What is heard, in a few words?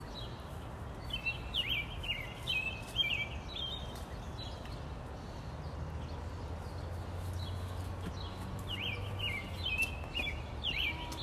Birds in DC